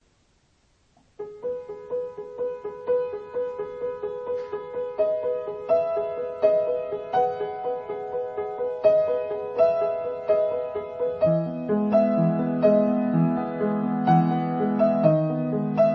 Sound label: trailer music piano; welcome to the rileys